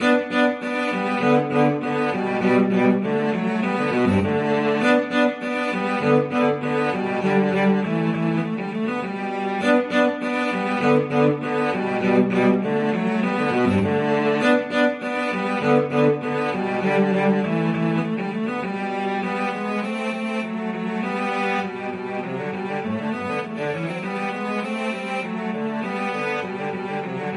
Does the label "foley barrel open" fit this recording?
No